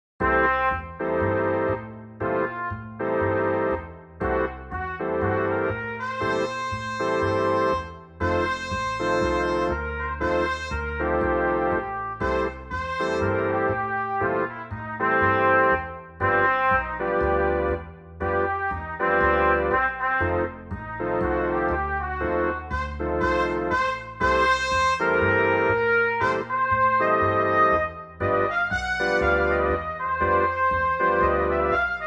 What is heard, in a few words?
MIDI blues; spellman studio